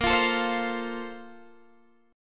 Bb Major 7th Suspended 2nd

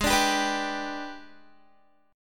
Ab Minor Major 7th Flat 5th, down, Guitar; strummed; AbmM7b5

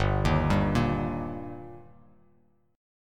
G Minor Sharp 5th G Bass Standard, chord; arpeggiated; JGuitar

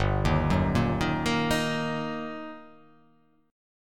G Minor Major 7th Sharp 5th G down; arpeggiated

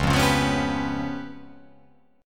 C sharp 7th Flat 5th, chord

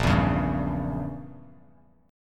Bb Diminished 7th Bb Bass Standard; strummed; JGuitar

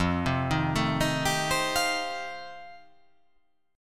F 9th F, chord

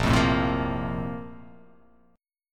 A sharp Major 7th Suspended 2nd, down

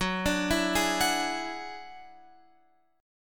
Gb 7th Suspended 2nd Sharp 5th, Guitar, Open F; arpeggiated; JGuitar

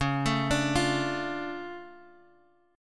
D Minor Major 7th Db

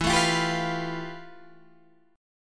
F Major Sharp 11th E

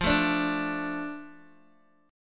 F 6th F down Guitar DADGAD

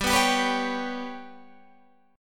G Major Flat 5th, chord

